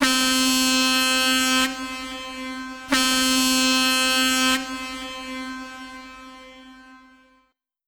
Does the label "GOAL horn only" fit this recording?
Yes